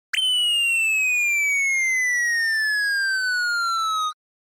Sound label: homerun; ホームラン1